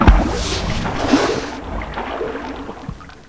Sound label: box float; ambience; caged